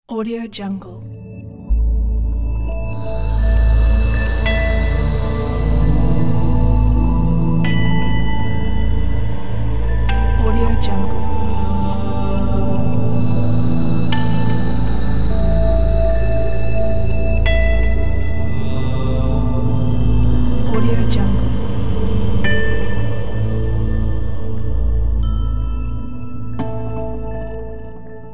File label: oriental desert of desire ident